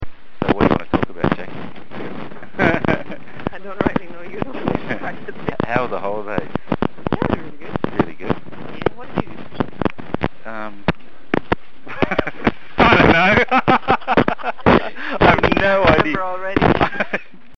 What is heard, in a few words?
Crackling